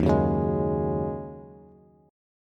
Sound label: Eb Minor 11th, Bass Standard; Ebm11 strummed; JGuitar